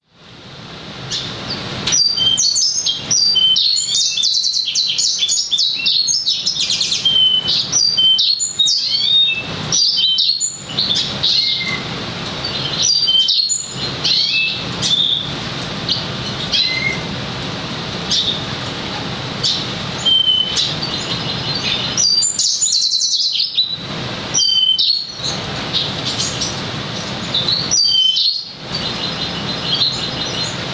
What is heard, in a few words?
lemon breasted canary